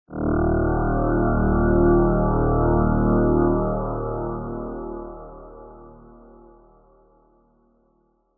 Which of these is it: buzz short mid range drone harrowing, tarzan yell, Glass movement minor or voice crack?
buzz short mid range drone harrowing